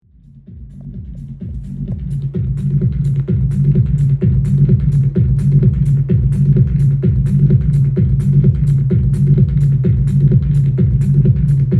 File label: Techno